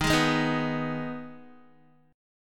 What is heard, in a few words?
D sharp Suspended 4th Sharp 5th, Guitar; strummed; chord; 10,11,8,8,11,x; JGuitar